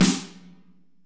snare, Kurzweil K2000

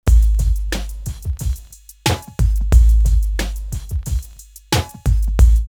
808 Great 90, drumloops OldSchool; X-Static Goldmine

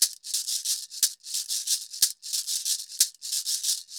Cabasa, Festa Latina, Drums & Percussion; Best Service; Festa Latina VOL-1

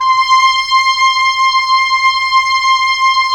Oboe f; Roland - LA Composer vol. 4

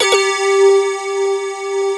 BELL, HOUSE

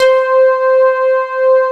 ANA PADS; Pro-Rec - Synthsonic; JV ANALOG1

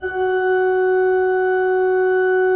F3, Cathedral Organ; ILIO; Propeller Islands Cathedral Organ

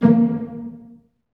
STR SEC, STRINGS; Roland - LA Composer vol. 1

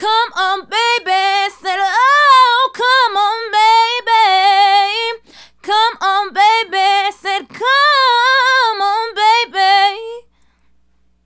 Female Solo, Vocal; Sonic Foundry (Sony Creative Software); Vocal Toolbox

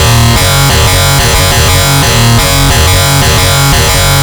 DISTLOOP, Big Beat Samples; DISTLOOP KIT; AKAI

